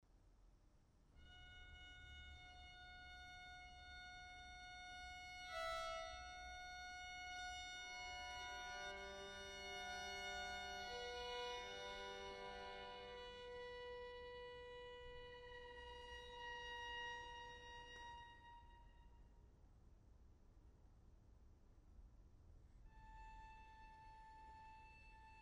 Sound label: Quartet